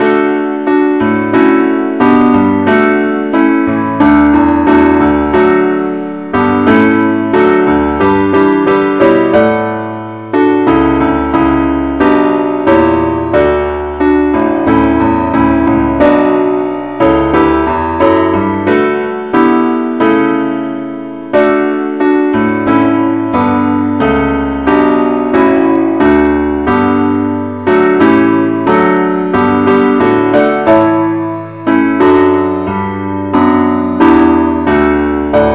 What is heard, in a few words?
keyboard only, key d; christe Sanctorum